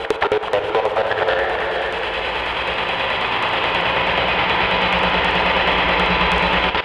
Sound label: techno vocals 140